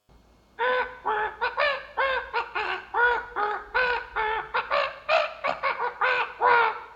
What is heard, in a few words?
Black Bird Singing; Summer Catchers